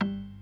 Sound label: PERC. C1; B3 FULL